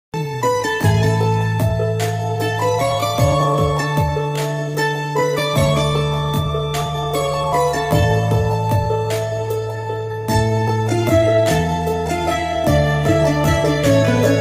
Pakistani Drama Instrumental; Mushkil

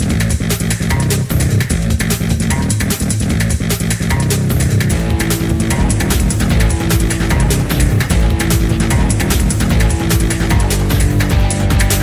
Ringtone Electronic

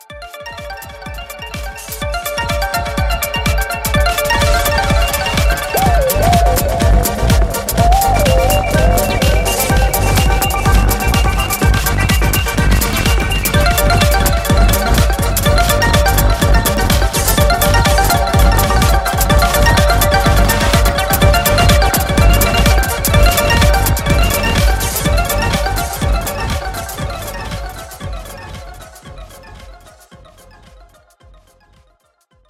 Vivo Y33s 5G ringtone

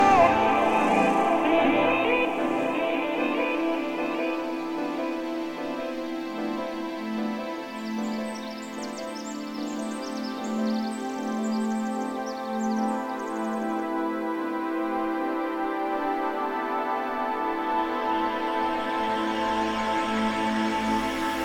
Sweet Electronic Ringtone

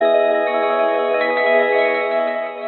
Samsung Notification Ringtone

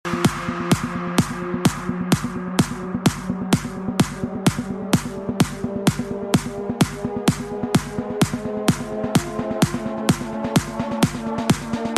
trance ringtone

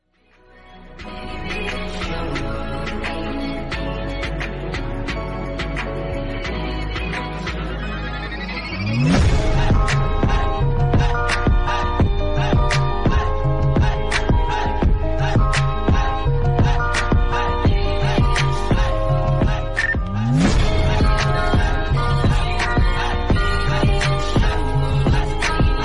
Remix

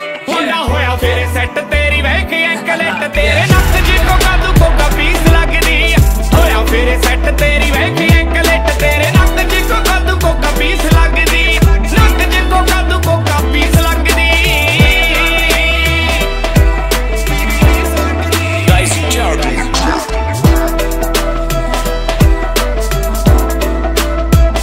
Top Punjabi Song Ringtone

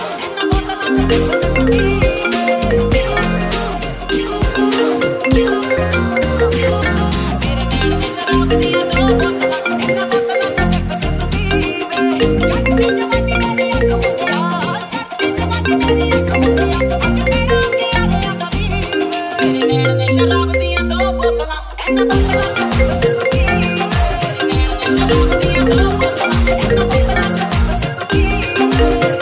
iPhone Ringtones

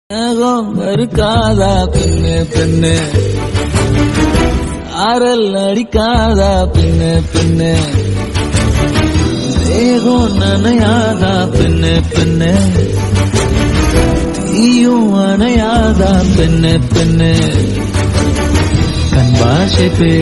Tamil Ringtone Song

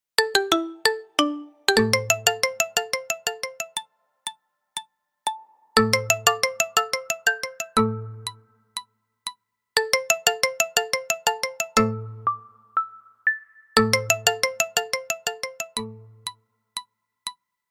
marimba remix ringtone

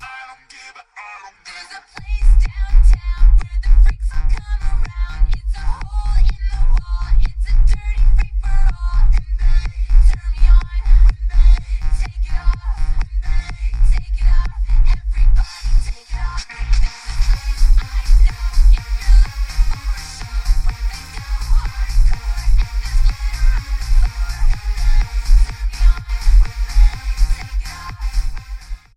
English Ringtones

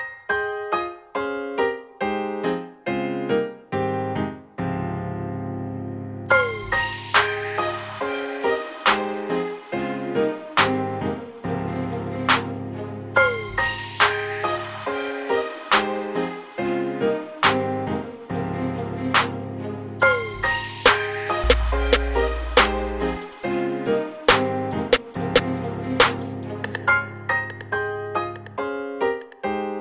Christian Ringtone English

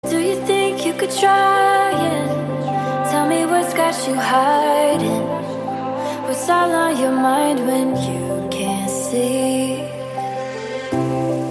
English Ringtone

